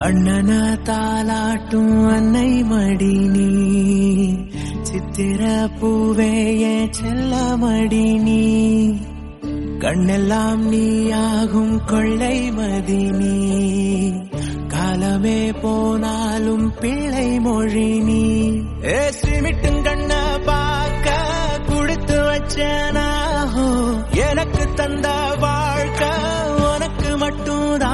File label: Tamil Song